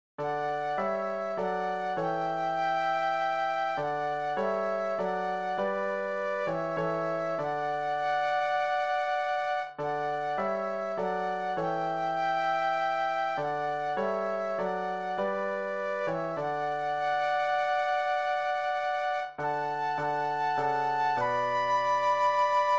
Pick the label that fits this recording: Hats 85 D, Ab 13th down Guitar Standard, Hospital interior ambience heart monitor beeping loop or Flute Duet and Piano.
Flute Duet and Piano